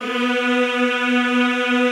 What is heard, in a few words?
B2, Classical Choir; Best Service; Extended Classical Choir